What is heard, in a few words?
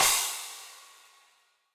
Crash FX 17 Direct Natural, Cymbal; Drum Hits; Standard Hits; Session Drums Studio